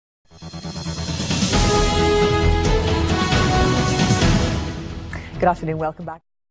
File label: news jingle; 2004; itv news